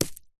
stone small land on dirt road small stones

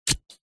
horror stab knife flesh guts squelch

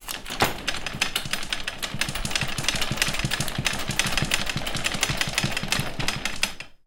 bed z bed folded up shake rattle long

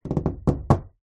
household knocking door wood fingers fast

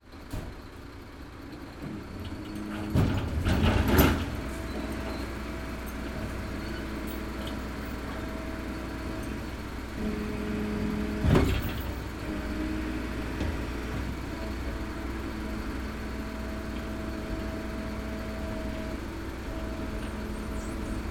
transportation truck unload skip

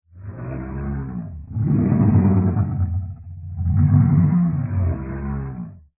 dinosaur large growl distant dull

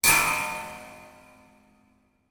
impact metal strike spring large industrial

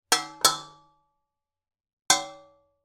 impact metal pan x3 resonates

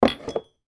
household cups mugs x2 place on hard surface; sound effects one

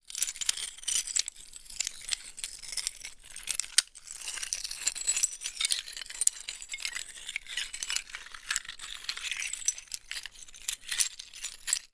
foley tile broken peices movement

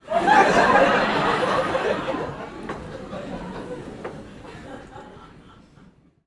human audience laughter comedy club x200 people

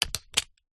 technology camera dslr lens cover remove; zapsplat

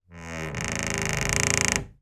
household door wooden internal old creak gentle

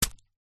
horror kitchen knife stab body; zapsplat

